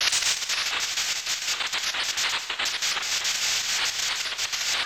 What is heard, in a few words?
PK Thunder; Ness; Super Smash Bros